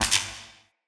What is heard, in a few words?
Cannon Tesla Charge Zap; Weapons; Player